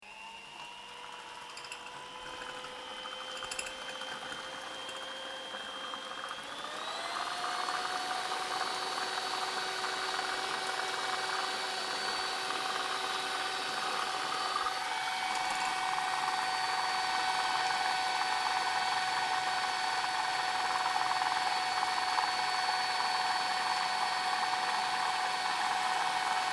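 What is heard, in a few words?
kuhonnyi mikser, kitchen mixer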